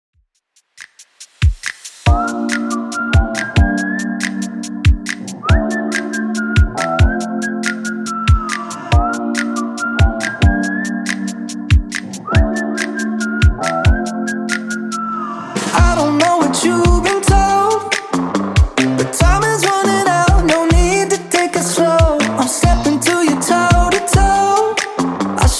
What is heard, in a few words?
Top40 DJ Friendly Redrum 140 bpm; remixplanet